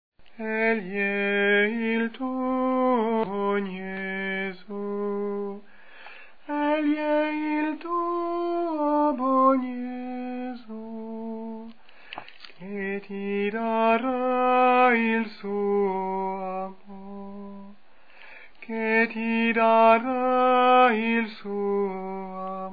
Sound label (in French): tenor, chants